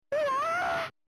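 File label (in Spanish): grito mujer, woman scream; final fight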